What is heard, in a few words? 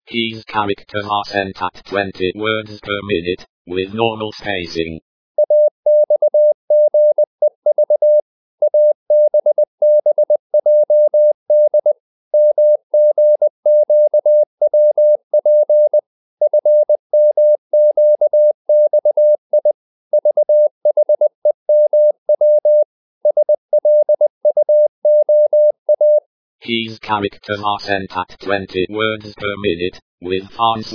morse